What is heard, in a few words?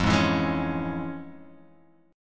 F Minor Major 7th Sharp 5th Guitar Half Step Down